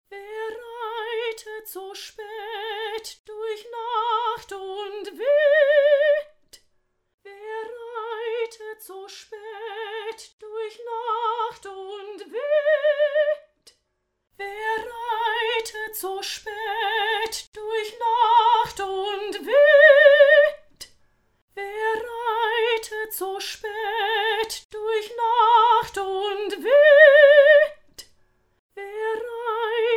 mic varianten, vsl dimension strings

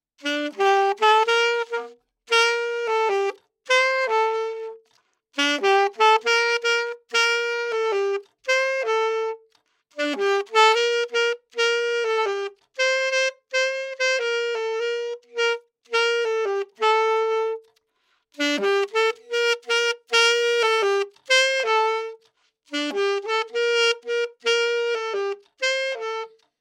sax test TG I51, Beyerdynamic TG I51

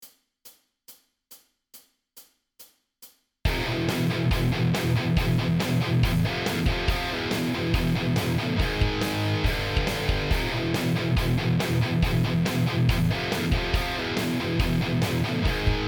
palm muting; MUTOTONE

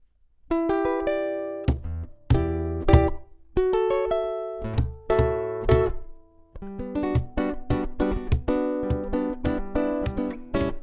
cajon; t bone cc75 klemm mikrofon

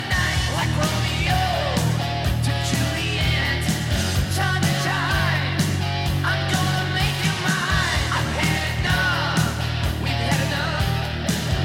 bass exampel metal, bass; squier affinity bronco bass

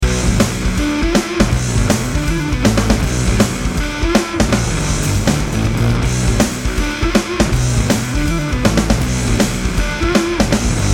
spaceman gemini iv dual fuzz generator

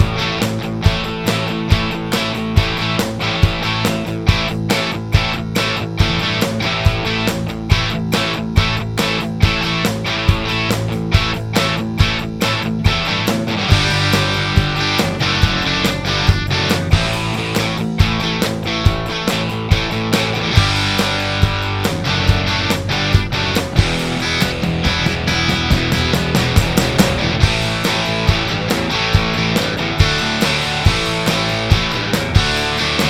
loop

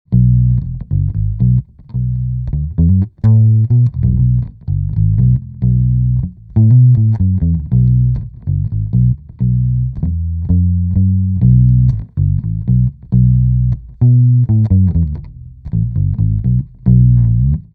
ukebass

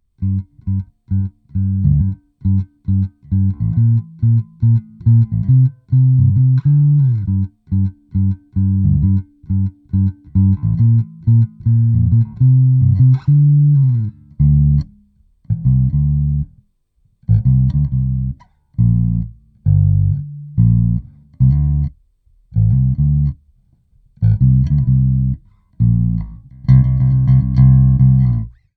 ev pl33 picked

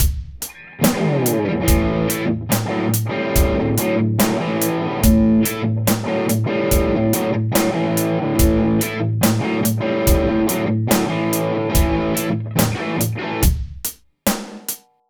5881 pedal; tad class a converter; originalroehren 5881

